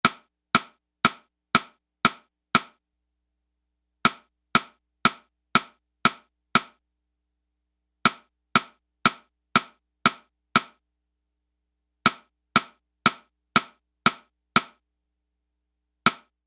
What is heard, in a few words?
Riff